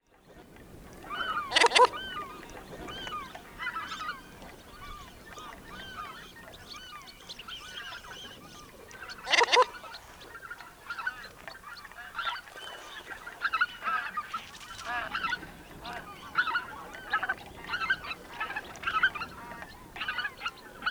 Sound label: Baikal Teal Sibirionetta formosa